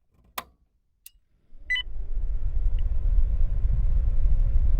nec fc9801k

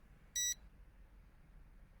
nec pc98lt

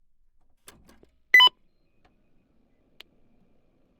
nec pc9801es2

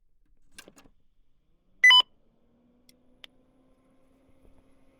nec pc9801da2

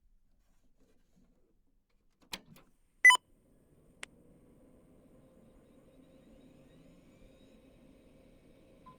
nec pc9821as2; odp66

